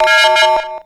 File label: beep, ui; Half Life Alyx